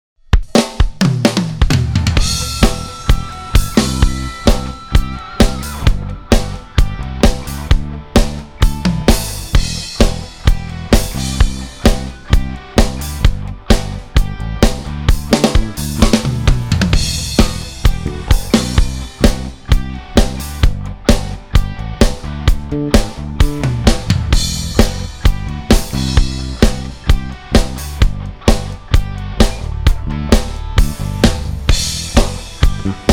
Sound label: Drums; Sheffield